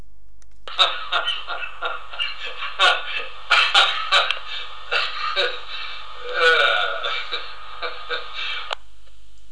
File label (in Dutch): lach